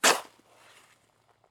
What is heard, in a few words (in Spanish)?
CAVAR HAZADA; Yesterday Origins; Switch, PS4, PC, iOS, macOS